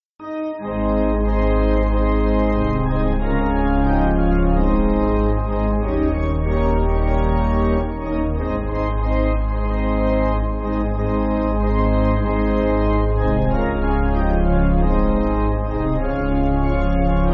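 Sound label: Pipe Organ, Backing Files for Churches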